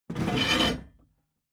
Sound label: metal scrape wood hard